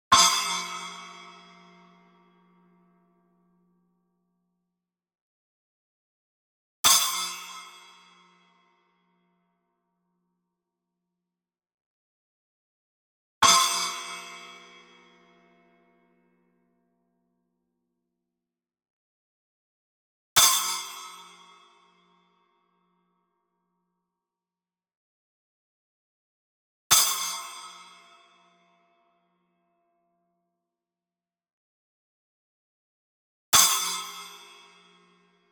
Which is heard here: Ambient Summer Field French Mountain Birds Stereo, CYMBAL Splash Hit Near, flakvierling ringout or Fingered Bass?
CYMBAL Splash Hit Near